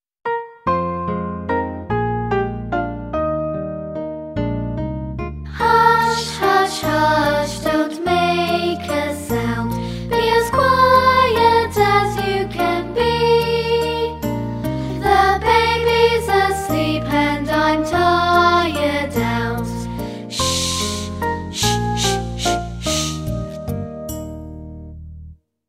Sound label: Jolly Jingle For Sound of sh